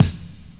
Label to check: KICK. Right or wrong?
Right